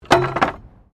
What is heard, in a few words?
Wood Metal Move